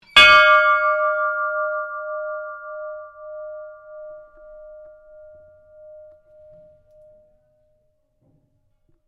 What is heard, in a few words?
SHIPS BELL IRON RING; Flinders; Various ships bells; Boats; Vehicles